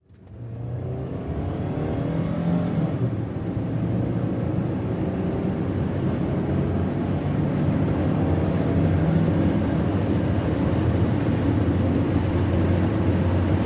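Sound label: Small Car Accel Skid Interior, compact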